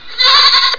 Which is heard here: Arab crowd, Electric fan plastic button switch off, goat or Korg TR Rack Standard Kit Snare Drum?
goat